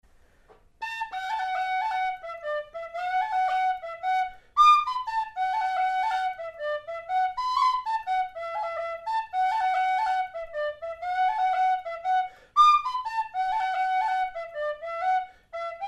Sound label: Galtee Rangers (whistle)